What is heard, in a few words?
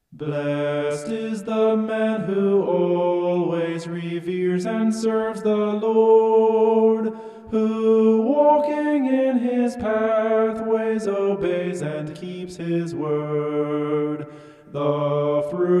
vocal homoph; psalm 128